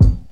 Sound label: raw hip hop kick single hit g sharp key